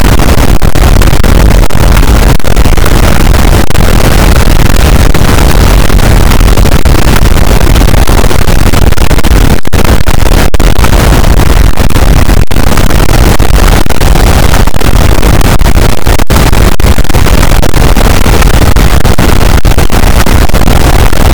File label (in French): cachalot positif, boat; Physeter Macrocephalus